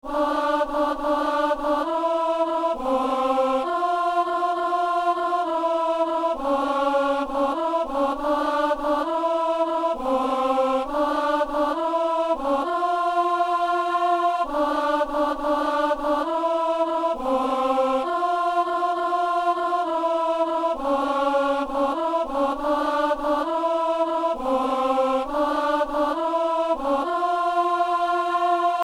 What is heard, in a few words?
alto; Messe coutrillonne - Anamnèse